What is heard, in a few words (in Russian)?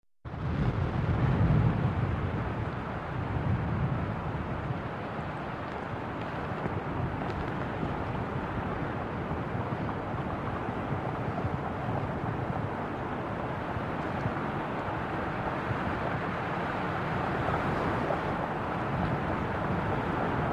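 шум ветра на фоне океана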